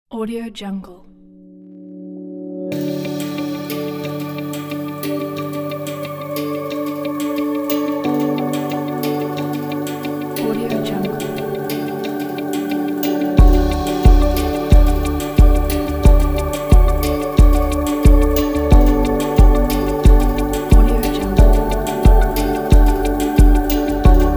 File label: ambient